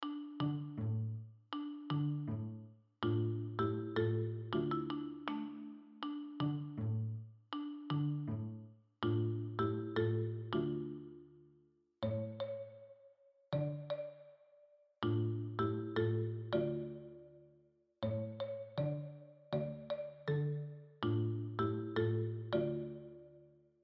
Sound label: tension underscore loop